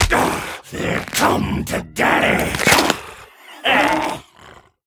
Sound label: Player Knockout (Kill Sequence); Devour; The Town; devour 2021 gamerip pc macos